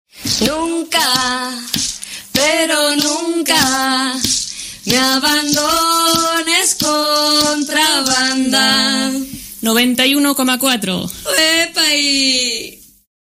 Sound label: Jingle Radio; kontrabanda